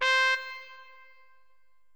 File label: solo trumpet